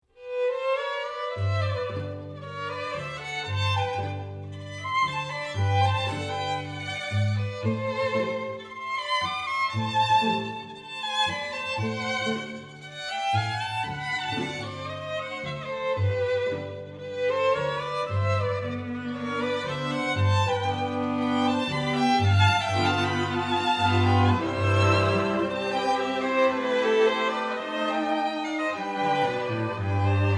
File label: Tango